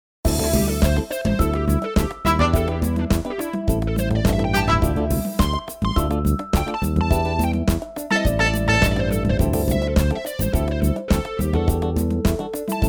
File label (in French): rencontre instrumentale